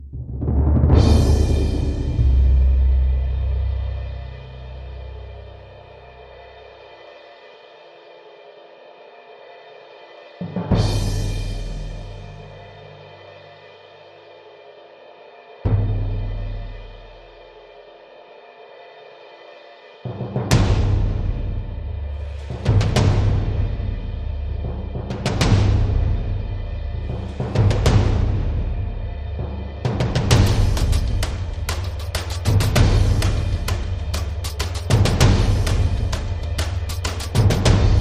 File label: Drums and SFX